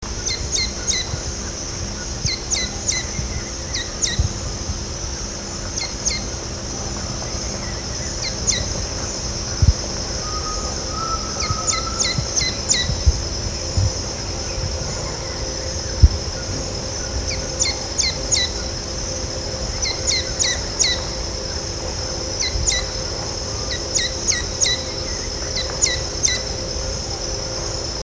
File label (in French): epervier nain